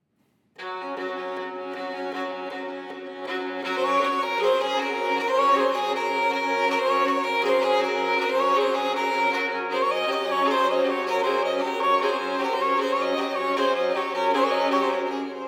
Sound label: Duos for 2 Violins, Allegro molto